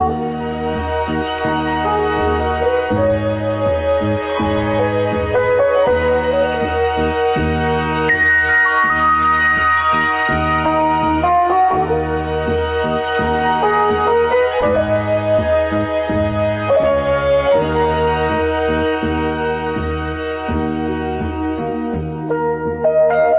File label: keys, jazz loops